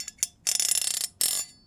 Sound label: Serrated Knife - Scrape, kitchen knives; Micro Pack